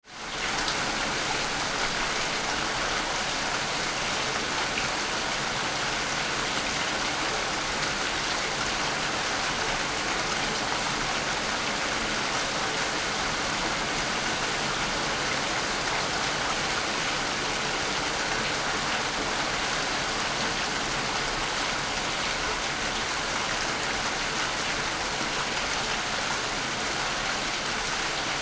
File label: Rain Sound